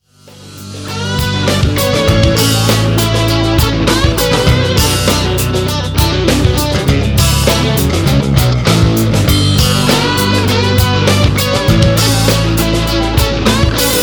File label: riff fragment